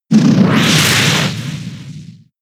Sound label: Fire Punch; Pokemon; gen 6; XY; ORAS